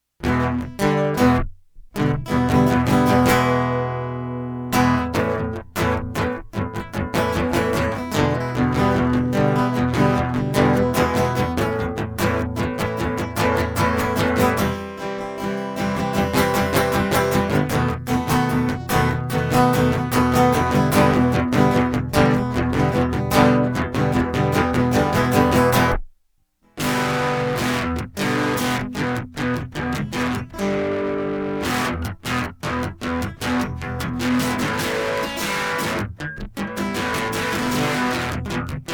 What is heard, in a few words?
acoustic electric intro